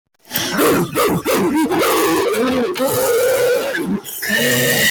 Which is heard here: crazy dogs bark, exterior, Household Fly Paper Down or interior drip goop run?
crazy dogs bark